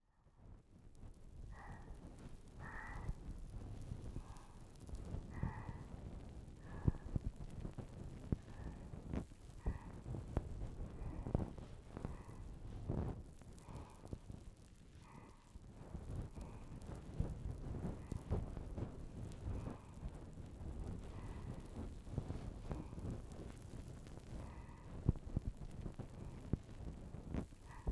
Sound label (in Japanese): 泡マッサージループ版; 安眠用ループ音声